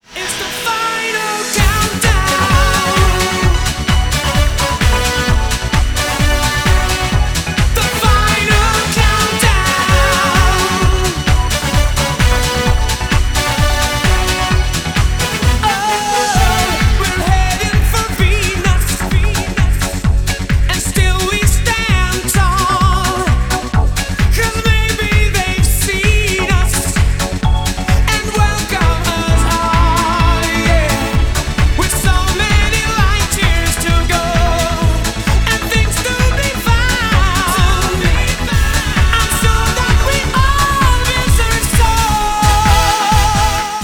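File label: remix, classic foreign rock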